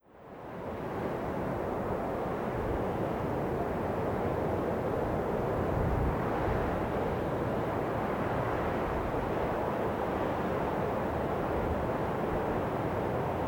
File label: Birjand Wind